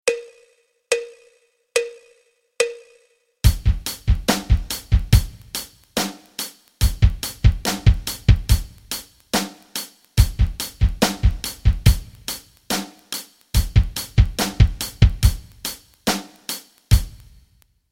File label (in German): rock rhythmen